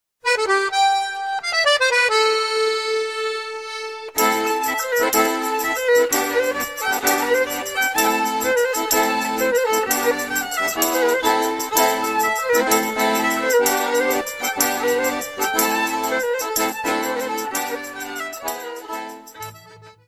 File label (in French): Square Eight - Ecosse